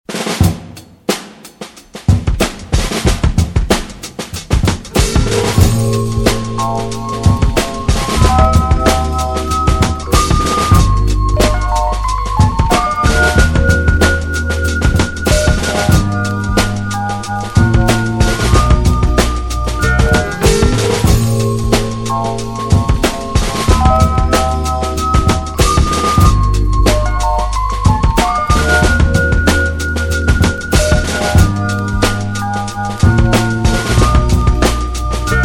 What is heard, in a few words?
Soul beats